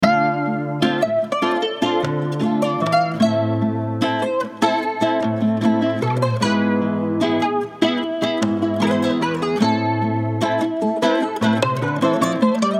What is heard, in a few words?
150 BPM Am; Perdon